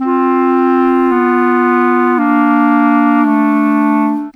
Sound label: Rock Pop Clarinet, Orchestral; Sonic Foundry (Sony Creative Software); Orchestral Series VOL-4 - Rock and Pop